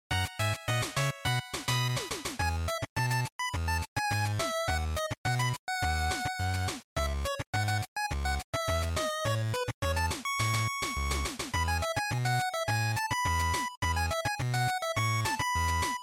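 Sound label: Game Boy Action Stage, bgm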